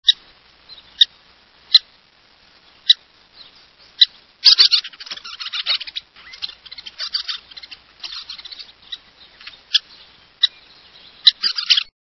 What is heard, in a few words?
Western Kingbird